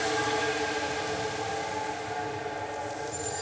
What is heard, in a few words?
TECHNO PAD 140; Keys